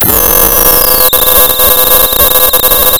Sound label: Sound of tearing paper, center